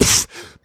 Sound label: Beatbox Snare, Human Beatbox